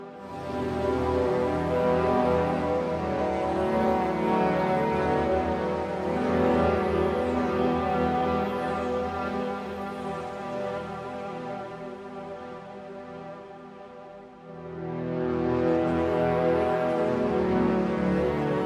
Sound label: Drawn Loop 100bpm; jenny leclue detectiv